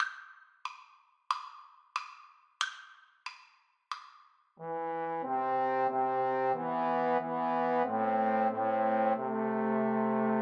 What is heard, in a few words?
go down moses, Trombone Trio